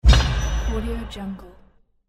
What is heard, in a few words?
heavy steel trailer impact